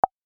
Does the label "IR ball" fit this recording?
Yes